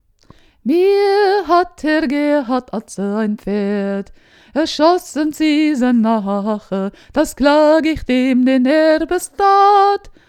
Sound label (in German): mittelhochdeutsch gesungen; Walther von der Vogelweide